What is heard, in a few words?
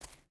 footstep barefoot concrete walk; Rust